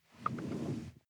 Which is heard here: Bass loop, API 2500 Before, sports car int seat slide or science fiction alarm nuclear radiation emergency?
sports car int seat slide